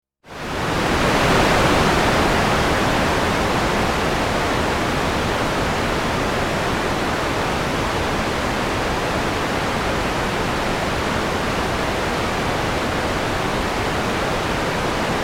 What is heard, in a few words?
White Noise, Synthesizer electronic white noise; Tones